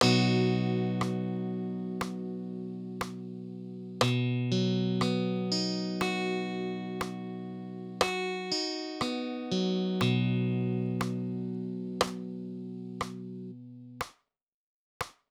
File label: B rhythm